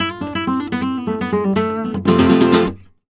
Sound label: Flamenco Guitar Frase; Best Service ProSamples vol.46